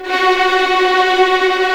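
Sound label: F#4 tremolo f violin right, violins tremolo; East West; The Ultimate String Collection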